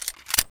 mac10 right clip in; garrysmod

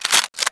g3 silencer off; garrysmod

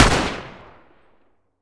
m16a2 fire, weapons; garrysmod

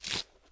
rip; weapons; mg; garrysmod; vollmer

